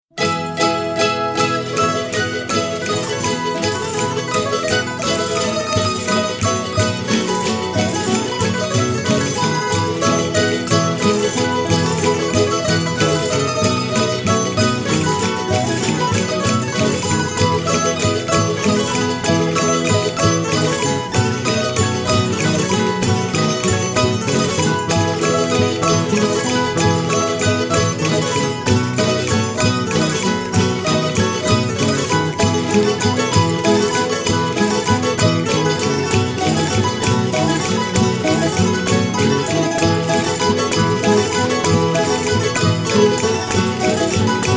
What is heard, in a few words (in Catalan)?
jota i fandango; masos Vistabella